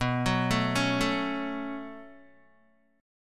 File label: B 7th Suspended 2nd, down, Guitar; B7sus2; arpeggiated; JGuitar